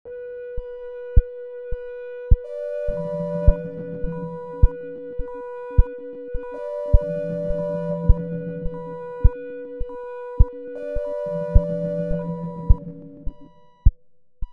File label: Sine Wave Project